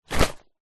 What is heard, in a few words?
foley jacket safety thick fluro hi vis drop wood floor